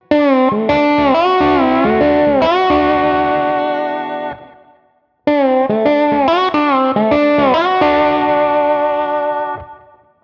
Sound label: dampening, slide guitar; how to play slide guitar; guitars